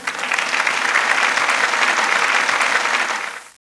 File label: claps; Alien